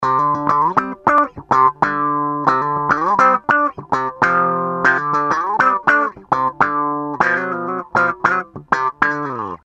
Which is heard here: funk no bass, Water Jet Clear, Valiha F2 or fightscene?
funk no bass